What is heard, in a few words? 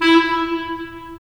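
wind clarinet; Roland - LA Composer vol. 3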